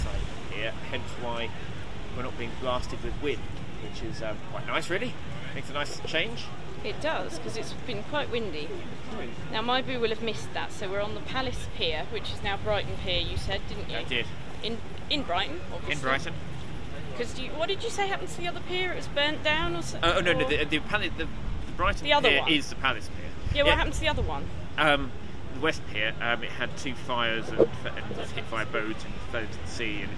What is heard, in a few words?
walking along pier with ambience of rides; righton birthday weekend; brighton pier